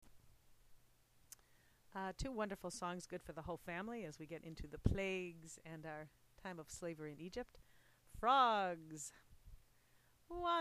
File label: frogs